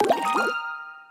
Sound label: win multiline; Hungry Shark; Wazdan